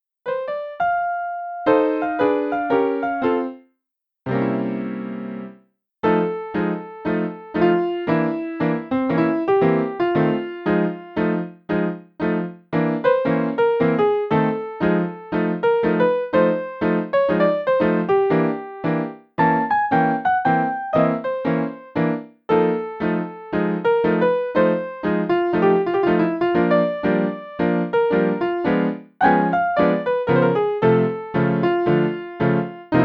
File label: Careless Love Blues Solo